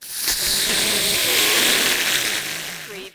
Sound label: Alien hatchling